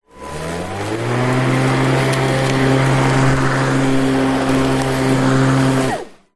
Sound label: household mower electric on run off short operator pov; zapsplat